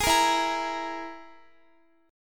E-Major Flat 5th E, Guitar; EMb5 strummed

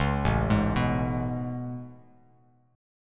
A Major 9th A, Bass Standard; AM9; arpeggiated; JGuitar

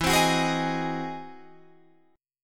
E Augmented Major 9th, Guitar, Open D

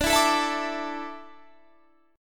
D Suspended 2nd, Guitar, Standard; 22,19,19,21,22,22; strummed

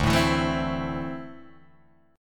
D 7th Suspended 2nd, Guitar, Drop D

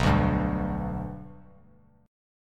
B Minor Sharp 5th B down; strummed; JGuitar

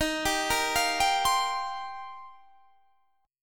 D sharp 6th Add 9th D sharp; D#6add9 arpeggiated; 23,22,20,22,20,20

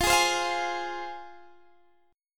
F Minor, Guitar, Open D

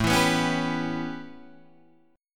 A 9th A, Guitar Open D; 7,7,9,7,10,9

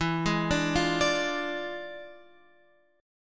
E 7th Flat 9th Guitar Open F, chord; E7b9; arpeggiated

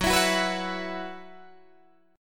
F sharp 7th Suspended 4th, Guitar, DADGAD

